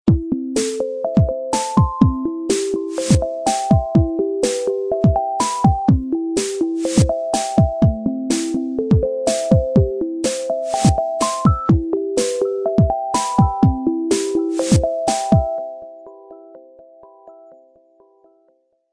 Chillout Ringtone

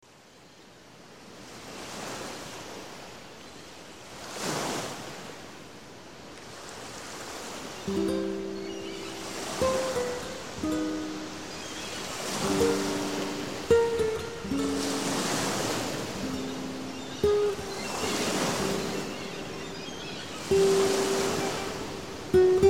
Romantic Sea

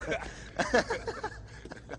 Laughing; Spike

